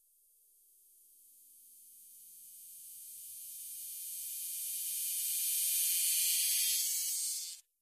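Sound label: Electric Buzz Rising; buzz & hums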